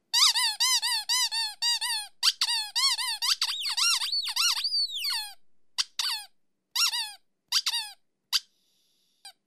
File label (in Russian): pisk plyushevoj igrushki